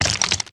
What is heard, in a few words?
ice particles; factorio